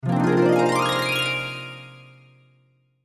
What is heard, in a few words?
Heavenly Harp, Heavenly Harp Arpeggio Pad Swell; Strings; Blastwave